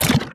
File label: lung barf; monstros